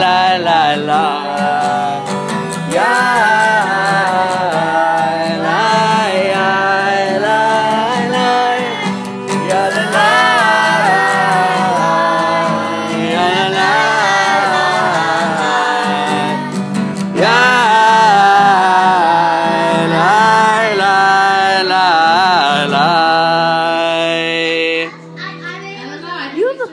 Little Kids Sing, Ma Tovu

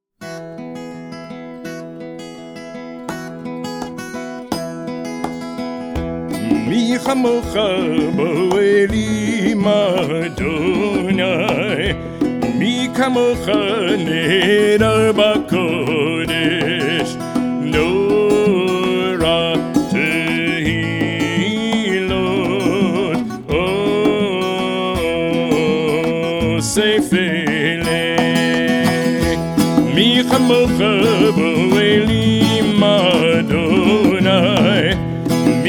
Mi Chamocha Studio; Cantors corner music; friday night music

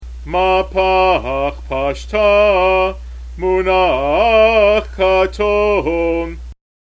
haftarah mahpach

